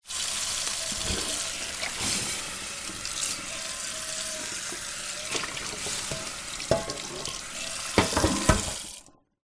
household frying pan hot cold water pour tap sizzle